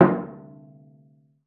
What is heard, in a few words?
CELLO FINGER; 90 s Sample CDs; Xsample VOL-5